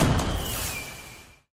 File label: tone; Fourth; seasonal